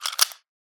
coins handling; soundscrate

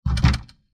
wood door pound other side 2 times

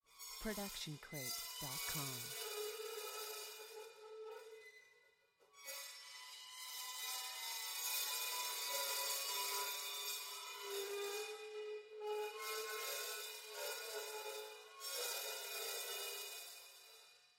hi hat 14 inch scrape; soundscrate

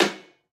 Metal Hit; soundscrate

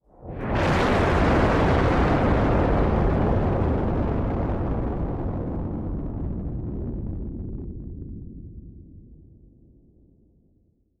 Nuclear Explosion Distorted; SoundsCrate

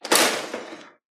printer opening; soundscrate

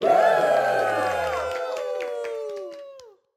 audience woos with claps

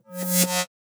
electricity hum short; SoundsCrate

